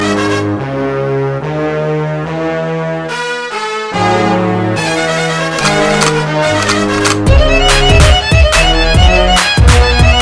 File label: Nutty Violins